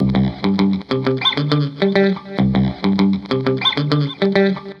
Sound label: Whip; Rock & Pop